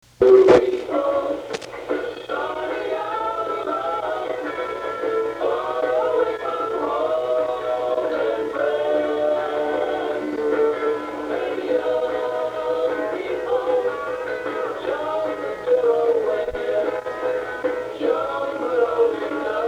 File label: opening theme; The New People